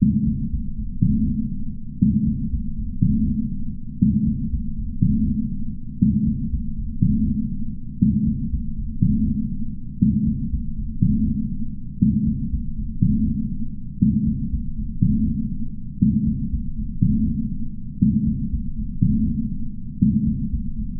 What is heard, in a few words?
Drone Percussive Rhythm; bottlerocket